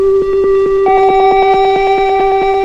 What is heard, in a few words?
melodic bleeping